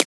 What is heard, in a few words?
Transient; FLPRO